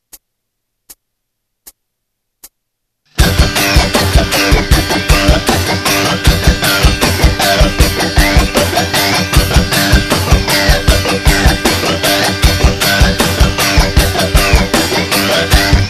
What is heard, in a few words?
scratch, guitar